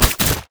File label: minefield land; LoL; ziggs madscientist